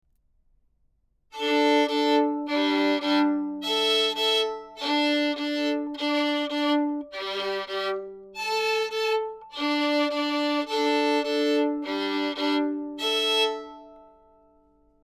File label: sE X1 S Violin Db Stops 24cm from F hole